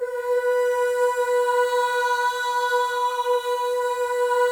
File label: MOVING V2, Symphony of Voices; Spectrasonics